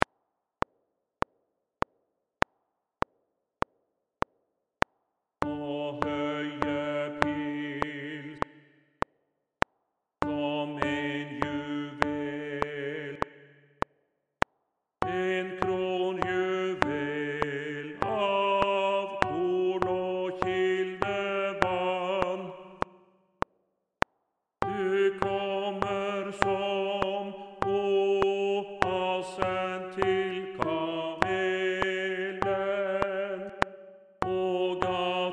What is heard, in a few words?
Tenor 2